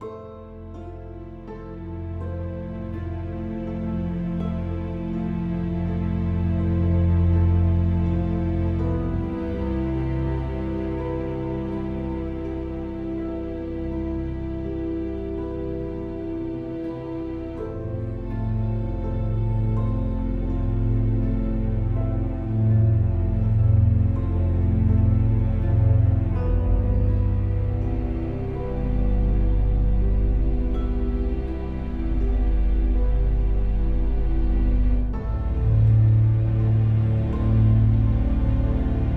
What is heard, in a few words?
loop Sad; the walking dead michonne 1 in too deep